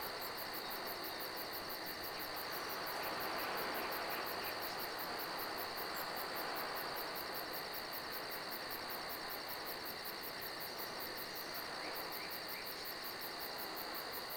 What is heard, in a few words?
no battle w wind; WAR